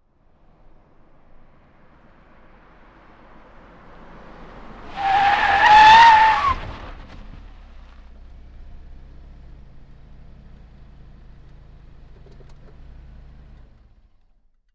Nissan Sentra In Skid, compact

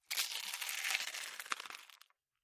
Slower Bite Chips

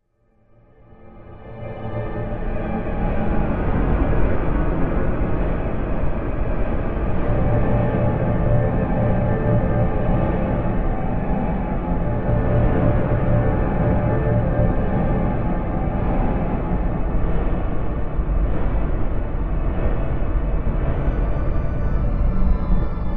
Music Eerie Horror, orchestral

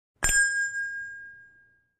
Shop Door Bell Rings

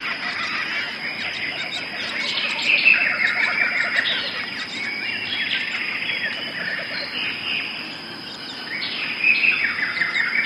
Birds Chirping Busy, jungle birds

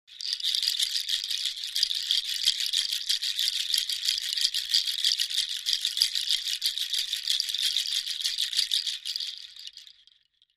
Shaker Bracelet Natu, percussion shaker

